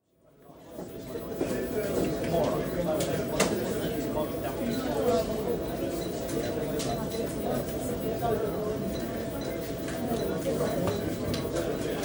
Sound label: City dealing room, large crowded electronic office; Buildings; BBC